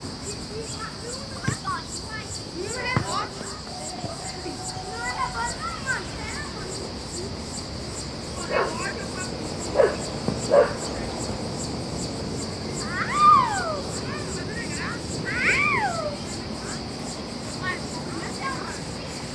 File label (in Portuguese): Criancas conversando gritando e jogando bola ambiente rural, grilos; cigarras, trans; Cerrado